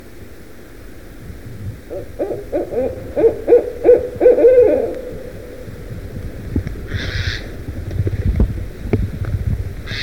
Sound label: barred owl crow